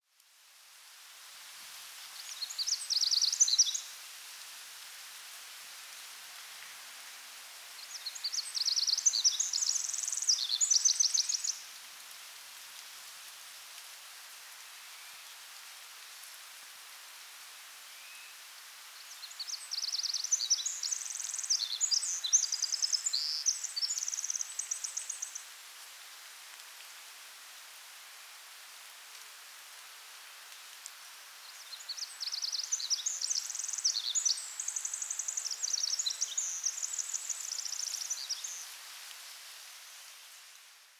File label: Pacific Wren